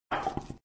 Wooden Fall; gamerip; shop empire 3

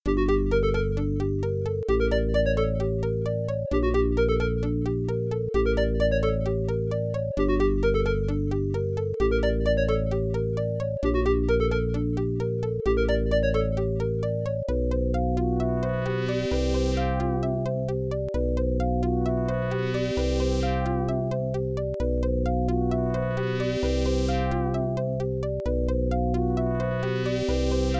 Adlib; Lollypop; MS-DOS

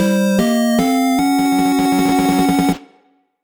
Game Start

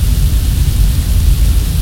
Weapons Rocket Launcher; Unreal Development Kit

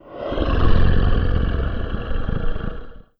dog

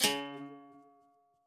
Instrument

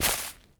Grass

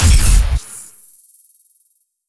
Sci-Fi Weapons-Sniper Rifle Single Shot

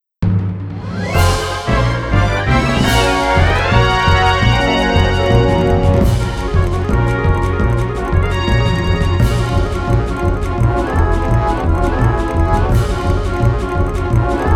Scattering Sparks (Battle BGM); genso suikoden iii original soundtrack

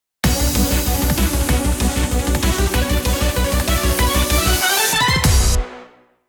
Jingle Legend; Pokemon Masters EX

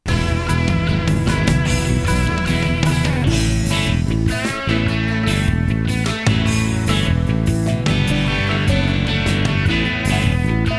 Rock; Backyard Hockey

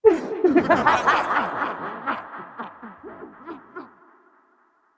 Laughter; Yu-Gi-Oh! Master Duel

